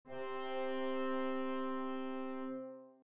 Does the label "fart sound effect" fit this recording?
No